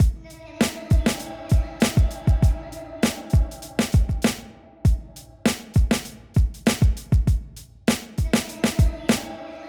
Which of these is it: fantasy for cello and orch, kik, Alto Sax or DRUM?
DRUM